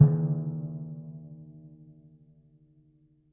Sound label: D#2 p, Timpani, piano; PowerFX; Downtown Orchestra; scales